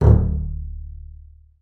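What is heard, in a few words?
marcato D1 left, bass spiccato; Xsample VOL-5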